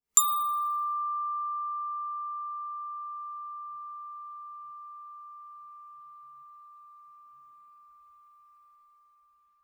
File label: bells brass ff D6 stereo; Percussion